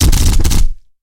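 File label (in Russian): глухой звук выстрелов из минигана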